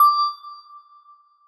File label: Bell D7